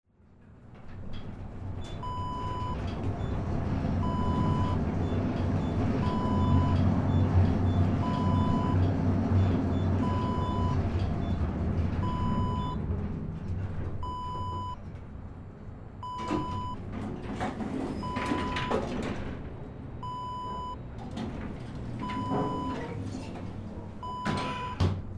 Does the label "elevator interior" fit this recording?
Yes